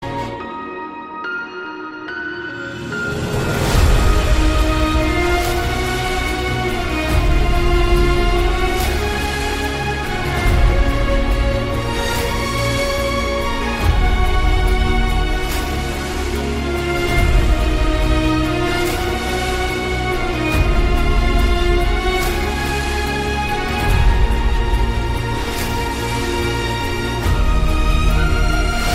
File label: Beautiful epic music